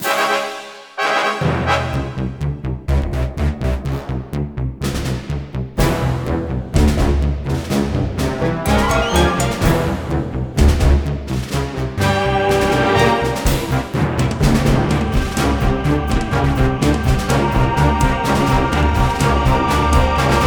Boss Squash Loop

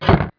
Metal Impact

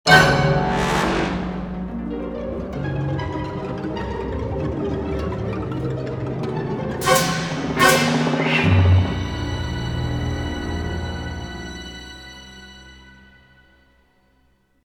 Eerie Event; Indiana Jones and the Emperor's Tomb; Xbox

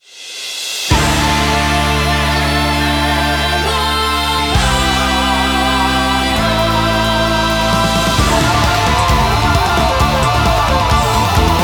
Battle loop; otome the exorcist; kannagi

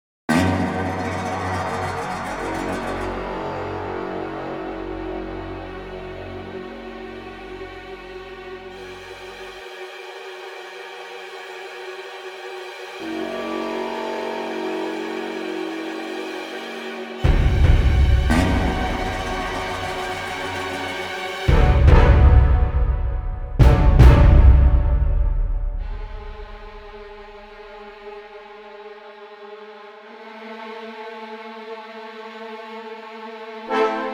Dark Disturbing

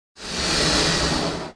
Swellbody

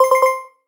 Score Increase

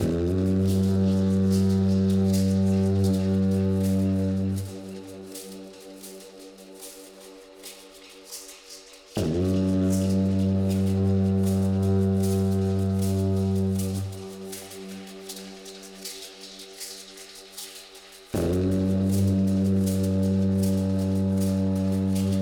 Combat Fight Medium DANGER; Eden Rising

